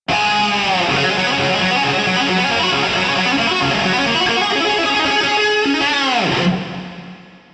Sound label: lick; brutal legend